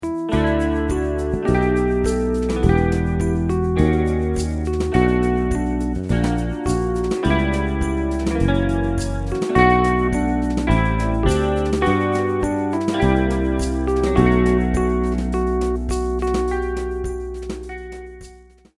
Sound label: Shaker